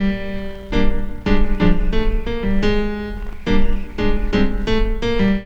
Piano; Sonic Foundry; Stylus Pressure Urban Grooves on Digital Wax; Back Forth

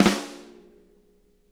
FLAM, SNARE; Sonic Reality; Interactive Drum Kits

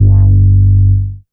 MOOG BASS G2; Best Service Dream Experience

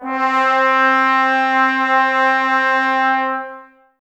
Brass, Woodwind Brass Ensemble

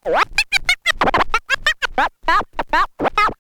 scratch short, Vinyl scratches

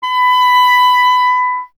CL B, Clarinet; Miroslav Vitous; Solo Instruments VOL-1

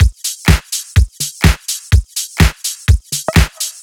Disco Bellz, Drum Loops, 125 BPM; Sonic Foundry (Sony Creative Software); Underground UK House & Electro

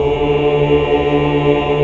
AAH 2 4 L; Best Service Gigapack 1

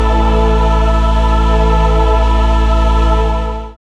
SYN DANCE; Sound & Vision - Gigapack II

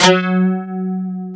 DS8 F#2, Korg; Back In Time Records; Korg Universe VOL-1

